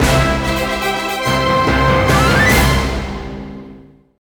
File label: (Jingle) Victory; Gnasty Gnorc's Cave; Spyro: A Hero's Tail